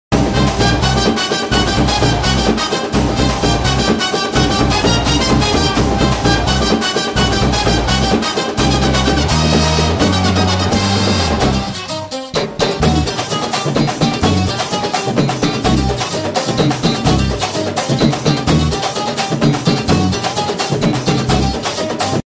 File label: bgm ringtone; tamil; mankatha; thala ringtone